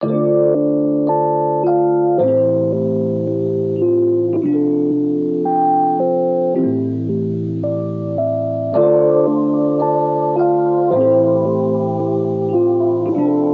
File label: 110 Cute Keys